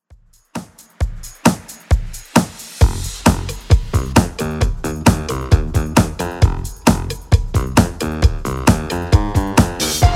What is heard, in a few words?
70s Redrum 133bpm